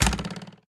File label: Crossbow Fire